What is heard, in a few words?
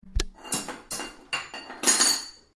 kitchen